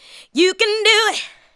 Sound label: ADLIBS